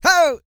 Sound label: E YELL, MALE HUMAN; ILIO; Vocal Planet